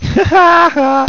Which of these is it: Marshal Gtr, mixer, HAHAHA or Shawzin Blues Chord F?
HAHAHA